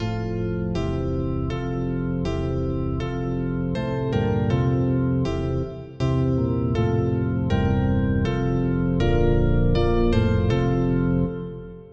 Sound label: polyinstru; hymns; Himnario grande